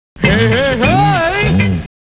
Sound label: rewind; cstrike